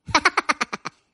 ha ha ha funny laughing tone

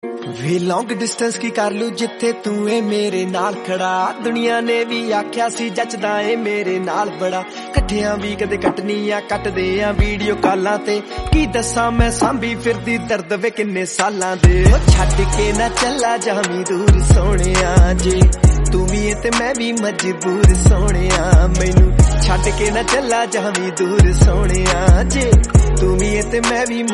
punjabi

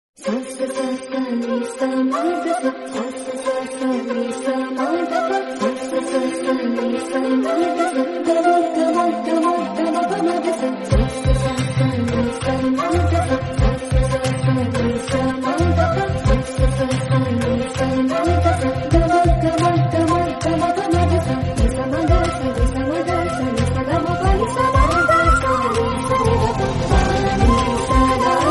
theme bgm telugu; sita theme; sita ramam

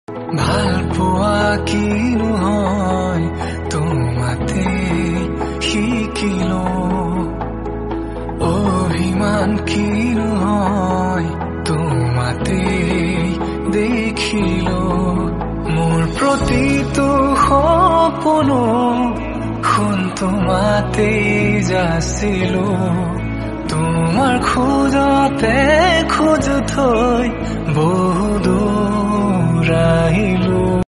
assamese song

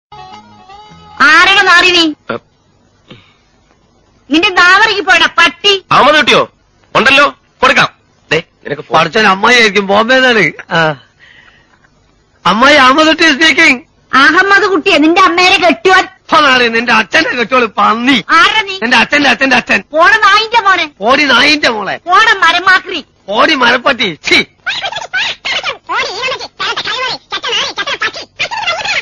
female voice malayalam love song

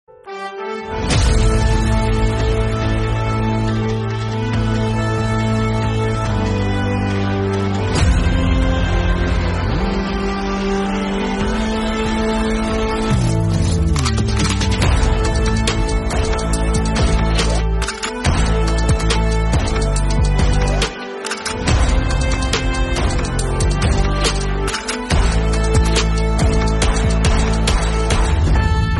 trap remix bgm

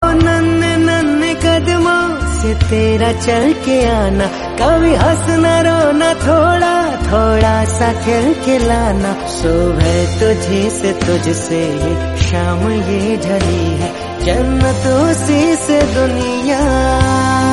hindi

